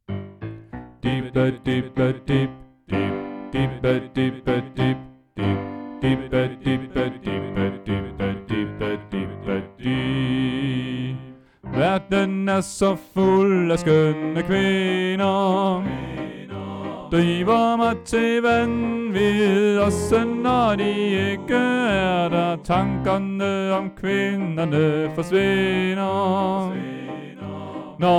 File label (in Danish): Bas; oevefiler